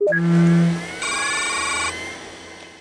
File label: startup; Privateer